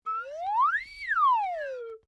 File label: Whistle Slide Up Down, Simple slide whistle